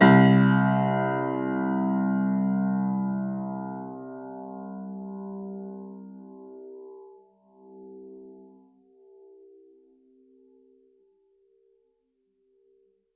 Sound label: Grand Piano, Kawai, Sustains, C1; Chordophones; Legacy